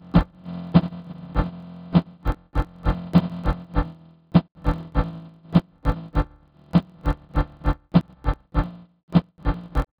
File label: drums, separated bass and snare drums; Sound Source Separation Using Sparse Coding